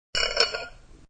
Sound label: Multiple clanks of a metal spoon stirring in a pot.; Objects